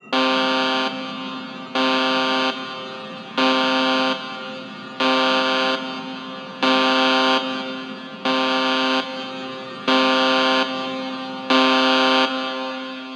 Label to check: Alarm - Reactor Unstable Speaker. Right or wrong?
Right